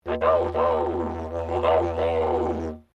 Didgeridoo Traditional Straight Vocalization, Traditional Australian Aborigine didgeridoo; Traditional Australian Aborigine didgeridoo designs; Miscellaneous Musical; SoundEffects Foundation2